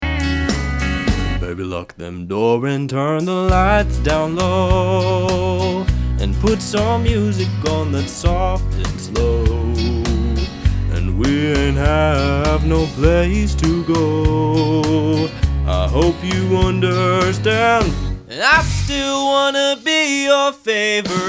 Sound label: Vocal